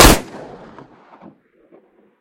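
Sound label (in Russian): выстрел из ракеты по дальней цели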